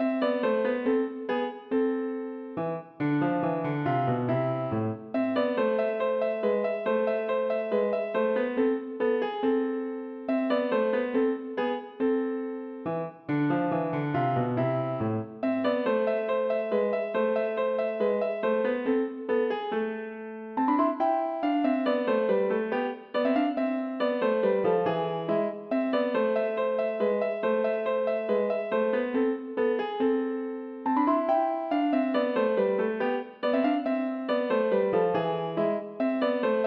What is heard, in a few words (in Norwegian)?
Menue for piano; Bang